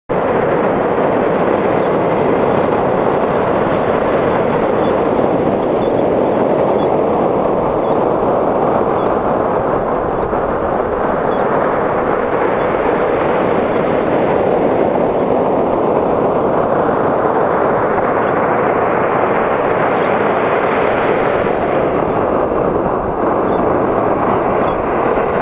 ocean surf, nature; Upon sight of the infinite blue expanse rolling its frothing waves upon the sandy beach; Nature's Music

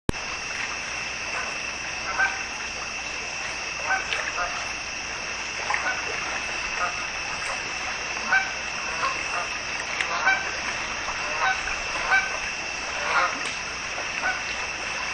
canada geese; It's early September and all the summer crowds have gone home; The lake lies tranquil in the dusk

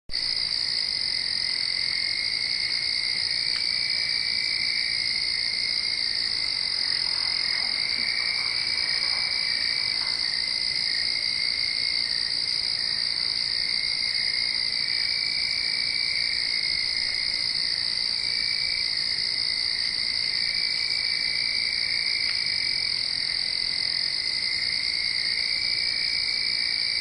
crickets, nature

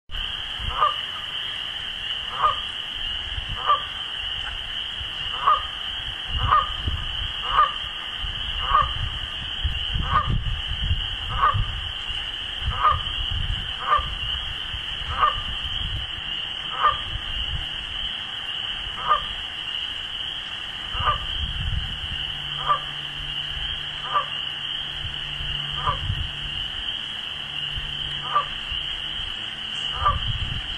Canada Goose; The ducks aren't the only ones glad for the open water. Off in the distance, a Canada goose swims around the edge

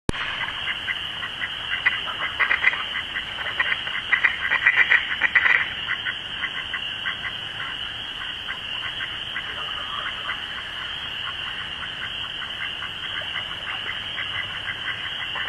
ducks; The lake is as still as glass in the chilly April night; Ducks at Night; The last few chunks of winter's ice drift around aimlessly

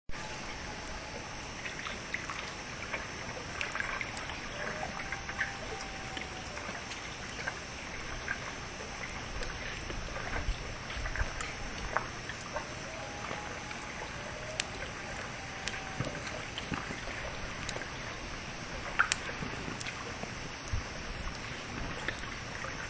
water distant loons, nature

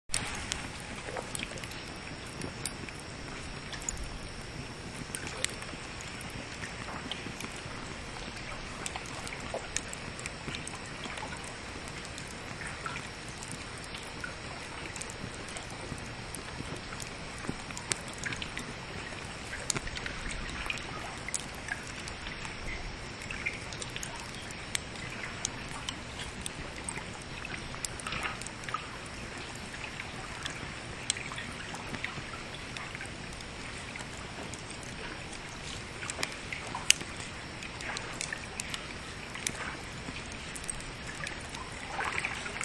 lakewater

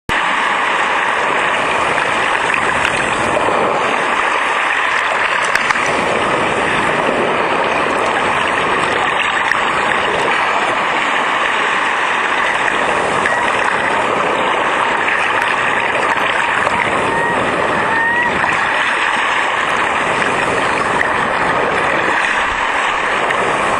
surf; Relax on a breezy but warm summer day at Newcastle Island just off the coast of New Hampshire; Ocean Surf

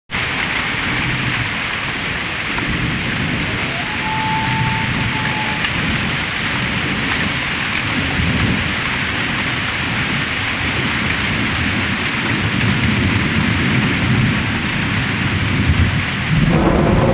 Loon Thunder; A severe thunderstorm was approaching, but this loon sang anyway.; Loon and Thunder

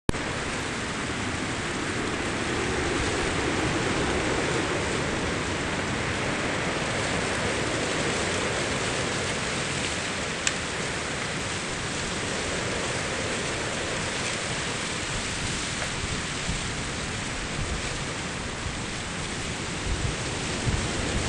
winter wind, nature

